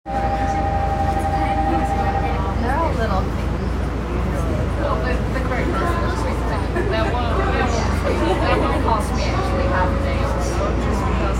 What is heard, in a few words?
train sounds; 小田急線新宿西